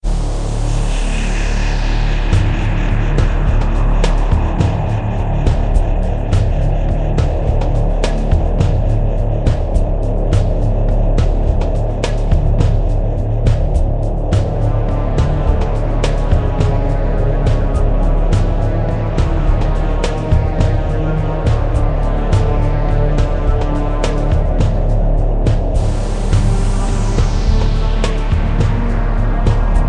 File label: music